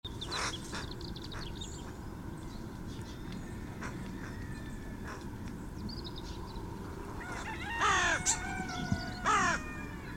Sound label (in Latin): Corvus corone; SETE